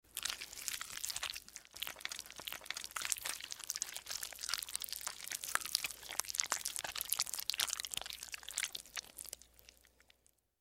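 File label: slime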